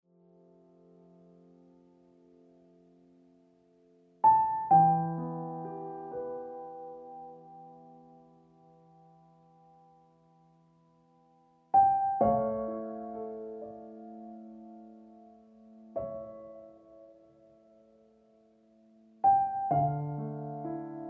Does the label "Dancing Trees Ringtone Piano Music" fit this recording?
Yes